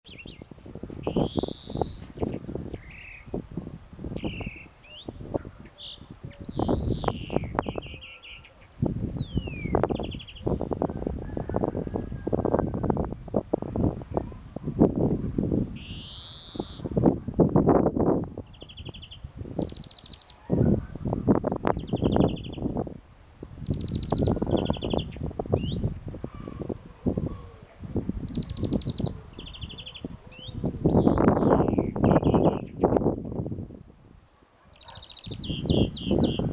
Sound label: Chloris chloris; SETE